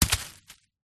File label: food passion fruit fall from tree onto ground; zapsplat